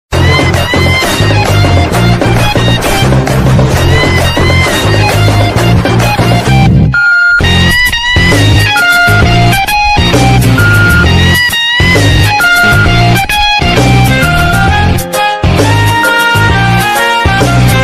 beep song bgm ringtone tamil bgm love failure

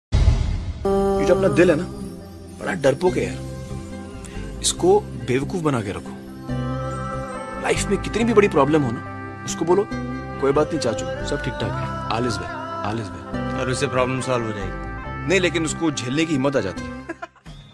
sad ringtone; 3 idiots

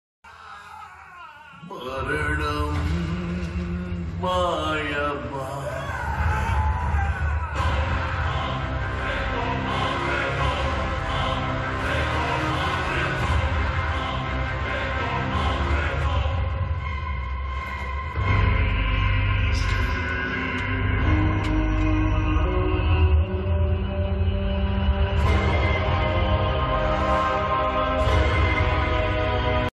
movie climax bgm ringtone; demonte colony 2

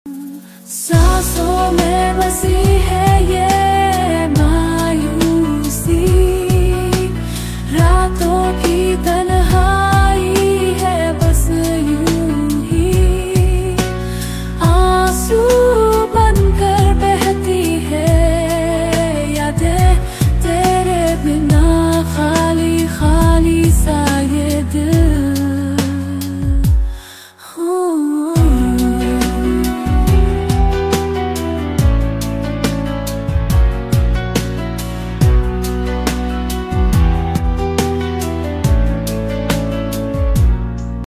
hindi ringtone, ringtone song, sad ringtone

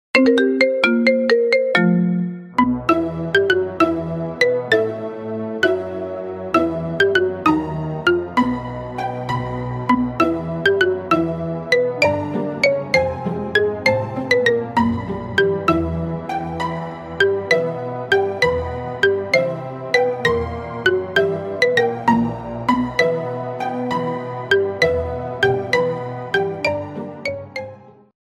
marimba remix ringtone

